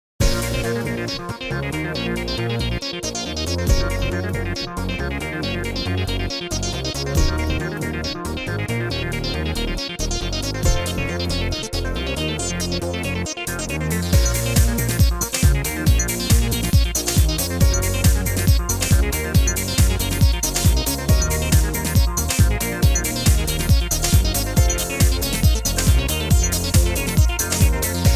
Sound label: loop